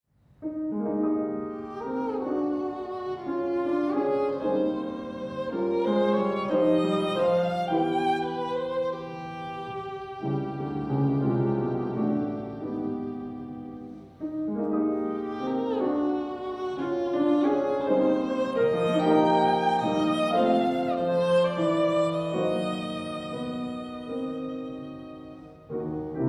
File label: Violin and Piano, Andante; Prokofiev Five Melodies for Violin and Piano Op. 35 I.